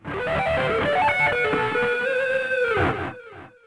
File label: sweep